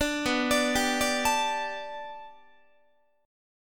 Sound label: B-Minor 7th Sharp 5th B down Guitar; Bm7#5 arpeggiated; 17,19,17,17,18,20; JGuitar